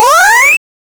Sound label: getting a power up